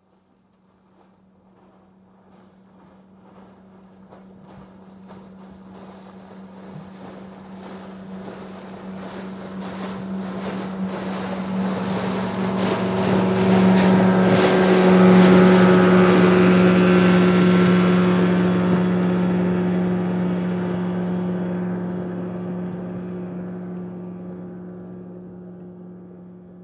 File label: Dive Raft Pass By Medium Speed Boat; Vehicle; Boats; Vehicles